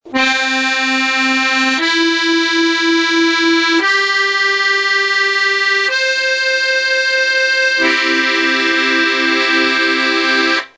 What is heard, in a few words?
diatonic joy italian